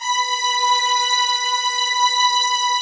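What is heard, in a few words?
Chinese orchestra, Filter; Optical Media International; Sonic Images Library; Chino Filter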